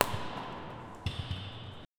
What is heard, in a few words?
a basketball hits the met